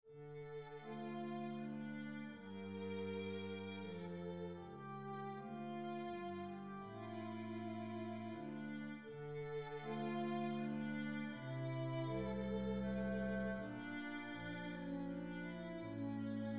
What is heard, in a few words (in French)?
sarabande mineur